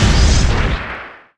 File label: charge; RYL 2 v1673